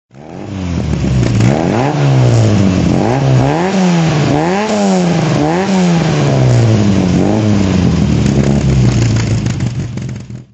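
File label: healey sprite throttle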